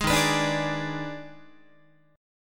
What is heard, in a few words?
D sharp Major 7th Suspended 4th Sharp 5th, Guitar; strummed; JGuitar